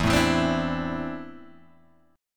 E 7th Suspended 4th Sharp 5th E down Guitar Open G